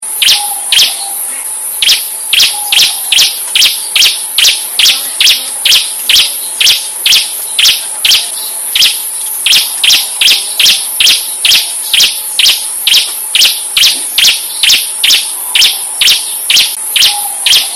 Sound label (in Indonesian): Suara burung ciblek keciap keciap